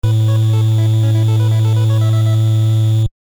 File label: Shoot Again